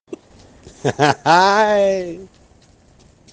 hahahae

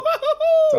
hu hu huuu

